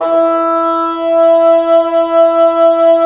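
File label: WND BN, wind bassoon; LA Composer vol. 3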